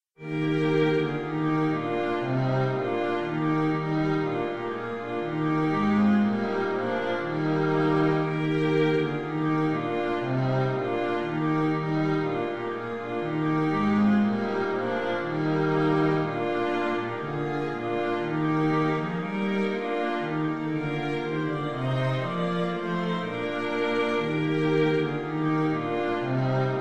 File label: deck the hall